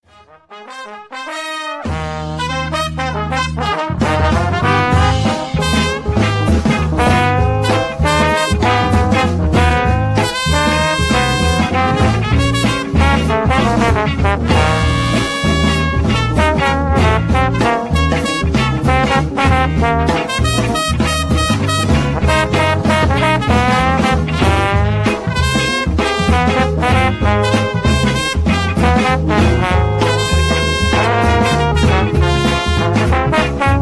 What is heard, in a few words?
Brass